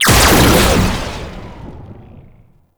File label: Shot, Weapons; Warframe; Corpus; Tenet Quanta